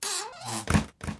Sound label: chest close creak; spookonomics